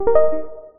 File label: Jig; Nintendo Switch